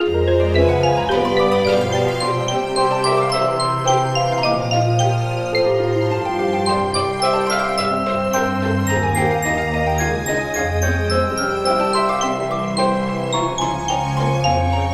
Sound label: Jingle Logo; Toki Tori 2